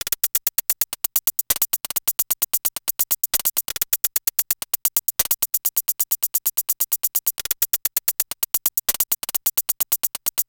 Hi-Hat; Sonic Foundry (Sony Creative Software); Afterhours EDM

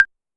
Claves 808, Clavia DDrum AT, Pitched Down

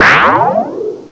WHOOP; EFFECTS; CMI Sound Library Rev 1.3

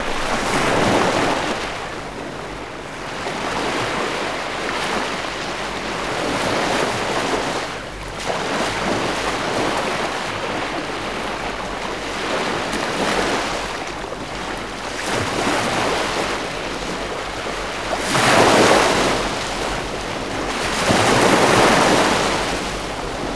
SEA, SEA WATER; Best Service - XXL Production Effects

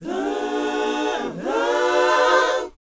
BOYZ E, Vocal Planet, R&B Dance; Spectrasonics Vocal Planet

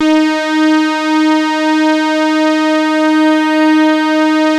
TARK SAW, Synth; InVision Interactive; Keith Emerson; The Most Dangerous Synth and Organ; SYNTH LAYER

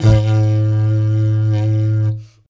ETH DIDGE; OZ!

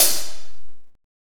KOMMERZDRUMS

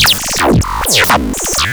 Grumble, Cwejman S1

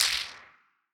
Wider Clap

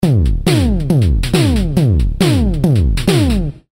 Loop 138bpm, Drums; leloops

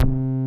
SPIKE BASS; Masterbits; Dance Trax

